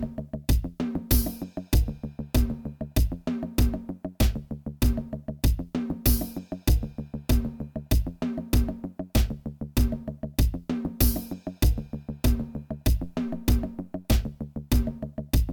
Roomy Bassline

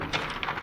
Turning Page

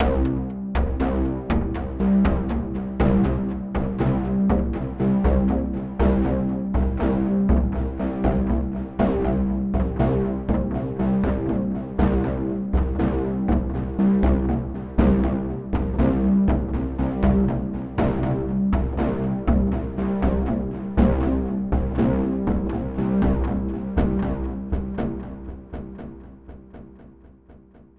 Background Beat In C maj

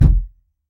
Giant step

